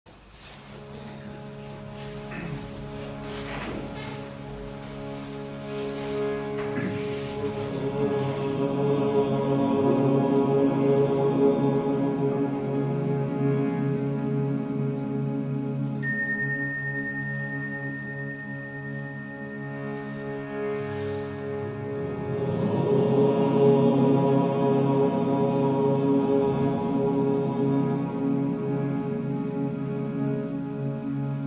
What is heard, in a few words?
Aum Chant With Audiance